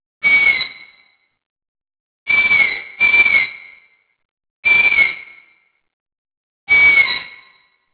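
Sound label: long-eared owl chicks; Long-eared Owl fledglings, Carrignagour, nr Lismore, 22 Aug 2010; Long-eared Owl Asio otus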